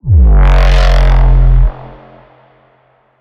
bass hit cinematic F; Virtual Riot

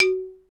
MLT XYLO

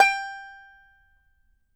banjo G5, banjo notes, country; Sampleheads; Whole Lotta Country 1 & 2

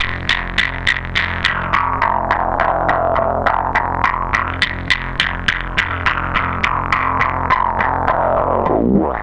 Distorted Bass, Electric Bass; Sonic Foundry (Sony Creative Software); Leo Cavallo Bass Taster

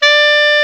KLARINET, Alpine Volksmusik; Best Service

